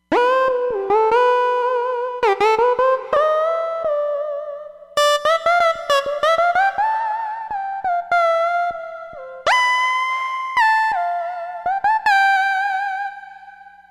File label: Legato Saw